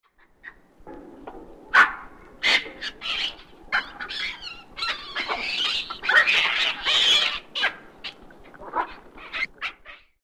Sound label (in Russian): zvuki babuina